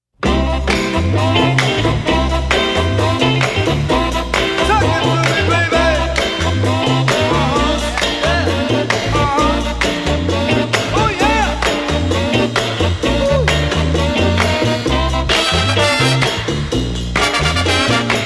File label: funky shingaling